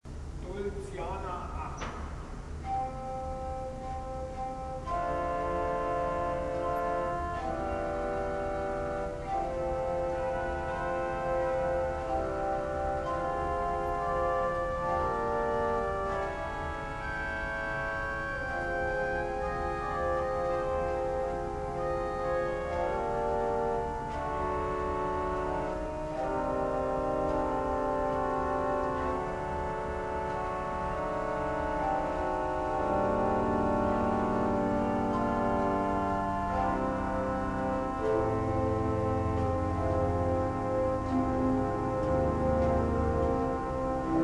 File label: dolciana 8